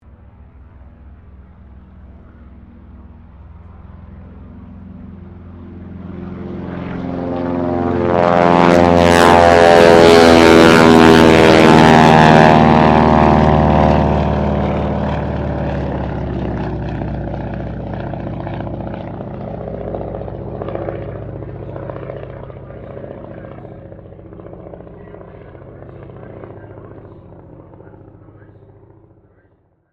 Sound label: AIRCRAFT AT-6D HARVARD MK III TAKEOFF STEREO; Harvard Training Aricraft; Airplanes